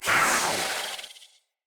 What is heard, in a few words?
Kavat Vocal Attack Medium; Warframes; Khora